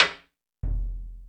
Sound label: Toms, Drum Machines E-MU Pro-Cussion